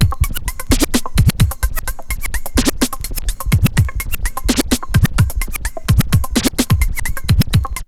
SCRATCH STUTTER LOOPS; Megabass Remix! 2

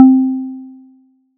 SY PLASMO; ModelCycles

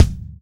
WD Kick, kicks; Roland BK-5

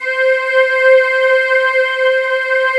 DEEP BRTH, BREATHY; Synth

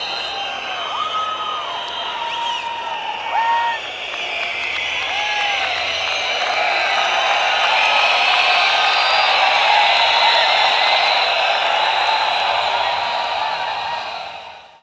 APPLAUSE; Best Service - XXL Production Effects

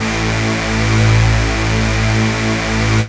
saw lead synth

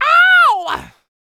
yell, male human, R&B dance vocal

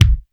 BD, Casio CTK-5000

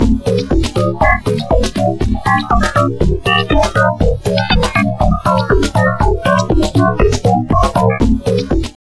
Drum Loops Crazy Processed, 120; USB Soundscan vol.07; AKAI

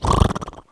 HORSE; AKAI S-Series CD-ROM Sound Library VOL-6

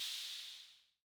Cymbal Long, rhythm king; classic machines

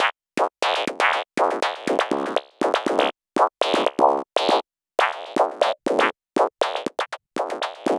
Rhythm FX, deep acid; Sonic Foundry (Sony Creative Software); Afterhours EDM

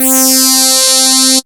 SWEEP C3; Best Service Alpha Dance vol.2

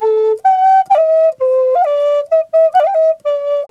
didgeridoo riffs

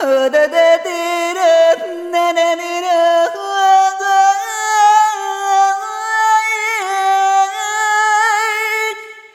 Arrhythmic F

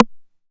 Rim, Roland MV8800; DIRTY 9

